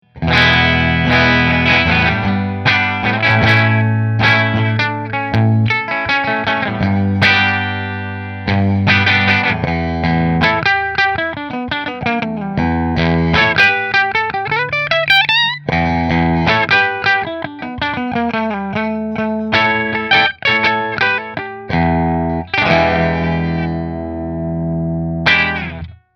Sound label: Mcnaught Blue Bridge Fender; McNaught Custom Guitars Vintage Single Cut Dark Blue